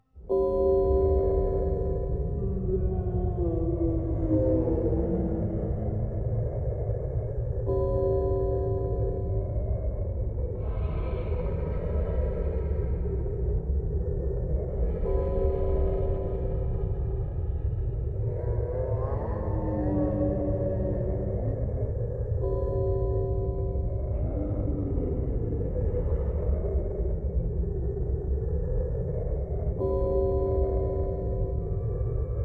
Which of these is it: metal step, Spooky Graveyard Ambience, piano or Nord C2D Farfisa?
Spooky Graveyard Ambience